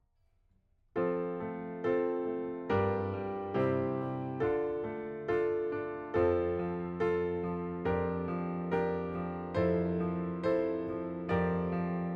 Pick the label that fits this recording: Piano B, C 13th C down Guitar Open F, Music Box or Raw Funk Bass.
Piano B